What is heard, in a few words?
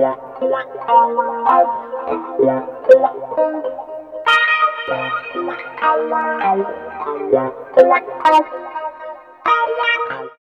GTR -L; East West - Phat & Phunky CD2; 90's Sample CDs